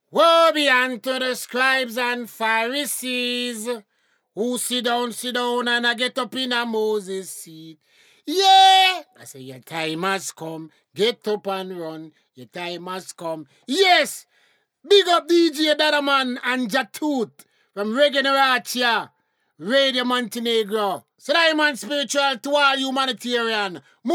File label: Spiritual Jingle